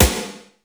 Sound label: MONDO SNR, METALIC DRM; Crypton - DrumBase5000; METALIC DRM2